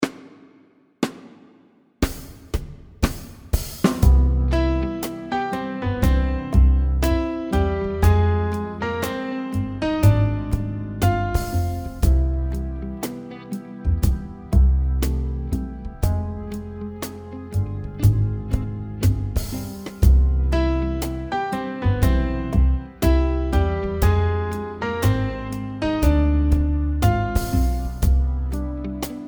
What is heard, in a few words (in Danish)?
Imitation harmonik C; Melodien spilles i 2 takter, hvor du lytter til den.; Imitation del 2 kap 4 opgave 2